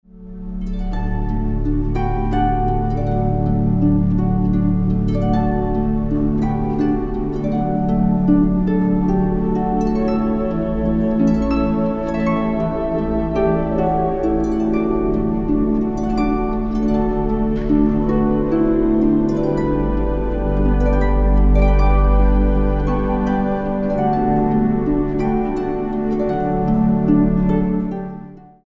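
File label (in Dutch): Stille nacht, orgel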